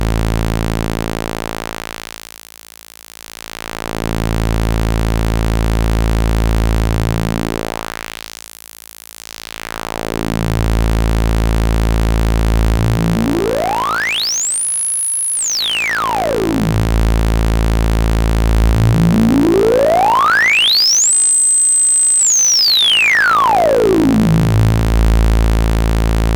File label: ks20 highpass, filter; diy